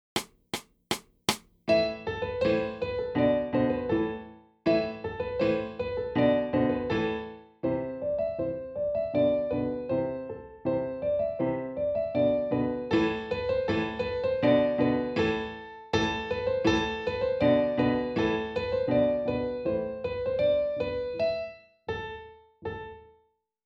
MEDIEVAL DANCE

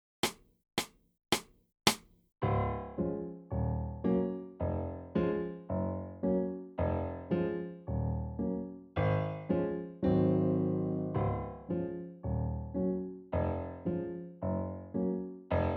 BLACK NOTE IMPROV

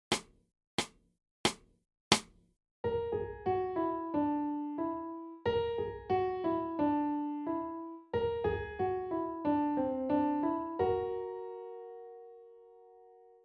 WHOLETONE

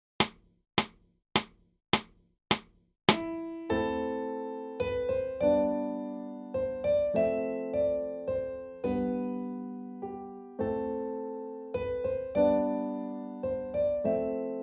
FOLK SONG

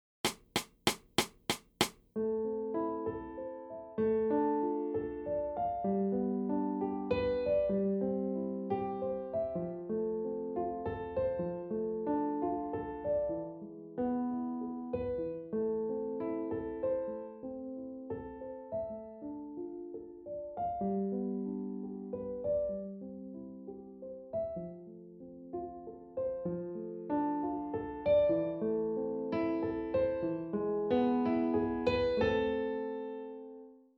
A MINOR ETUDE

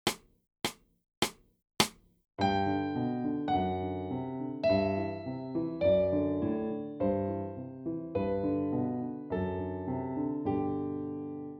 ALBERTI BASS

